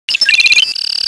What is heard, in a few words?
error, computer